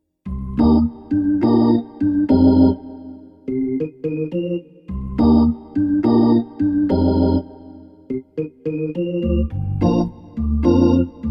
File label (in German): Ketron SD9 Orgel